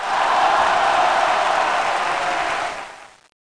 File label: crowds